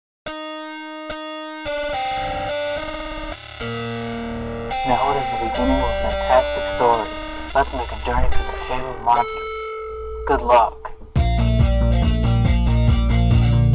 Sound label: Rock Guitar; Bubble Bobble